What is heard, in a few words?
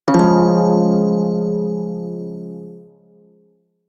Prime Shawzin Phrygian Chord B; Music; Warframe